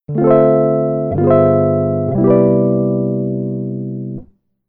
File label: Keyboard EP Soundmake Preset